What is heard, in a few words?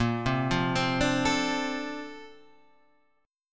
D-Minor 7th Bb, chord